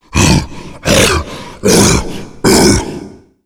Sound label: tank climb, growl